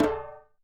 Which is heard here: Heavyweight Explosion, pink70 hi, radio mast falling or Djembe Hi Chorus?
Djembe Hi Chorus